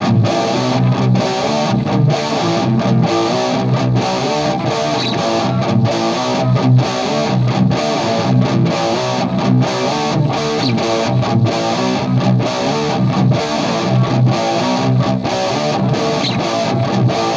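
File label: Stoner Rock Double Track; Bax Bangeetar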